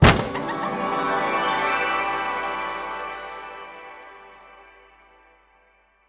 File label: bonus Game Start; fairyred